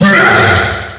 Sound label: jump; freak fortress 2; dry bowser